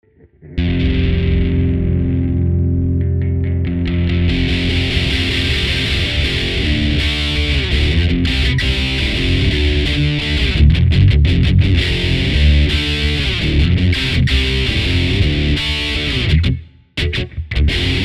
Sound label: Heavy